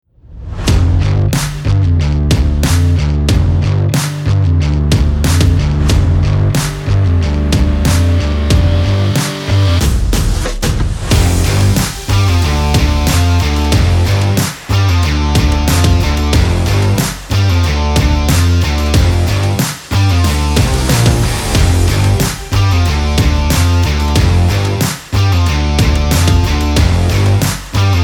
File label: sport gym workout music